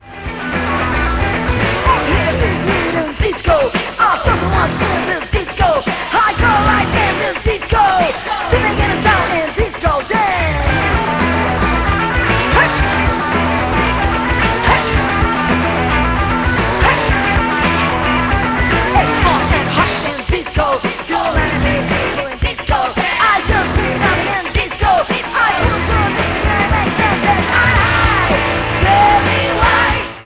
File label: disco dance